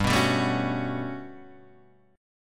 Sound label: G Major Sharp 11th G down Guitar Half Step Down